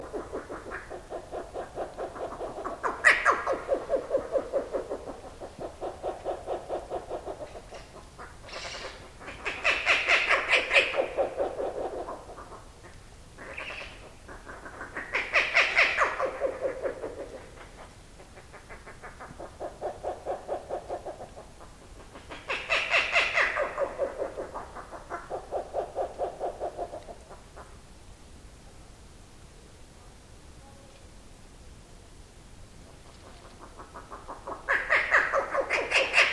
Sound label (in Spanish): Carabo bataraz Strix rufipes